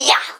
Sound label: Rogue Attack; Pecheneg